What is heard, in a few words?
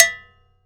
cha bell hi; CUBA